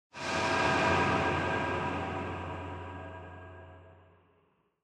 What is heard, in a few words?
Scene Flashback Mid